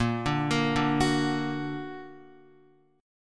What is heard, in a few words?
Bb Augmented Bb, Guitar; arpeggiated; JGuitar